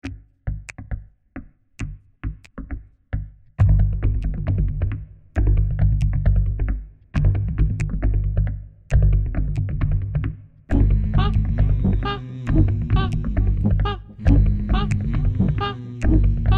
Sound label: Voices